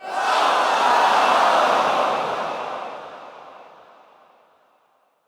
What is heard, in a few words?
Point Missed Crowd, Lunaro Crowd